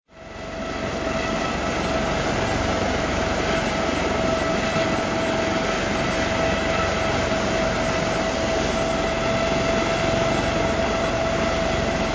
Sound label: Sea Helicopter Idle